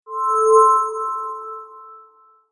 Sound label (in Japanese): 不気味な出現音